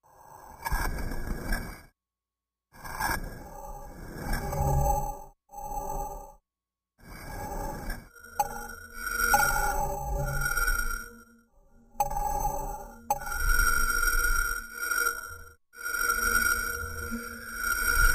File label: Space Suit Breath Mach, processed air hiss